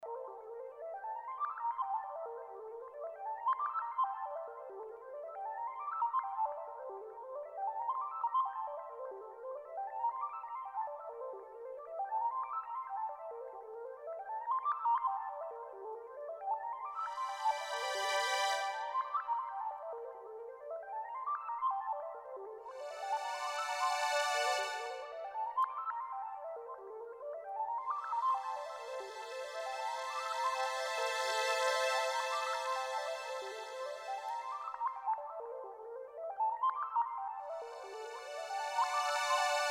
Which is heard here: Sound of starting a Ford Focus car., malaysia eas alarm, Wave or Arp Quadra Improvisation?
Arp Quadra Improvisation